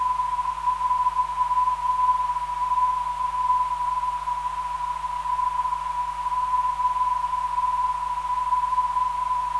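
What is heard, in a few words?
347kHz, non-directional beacon; MTN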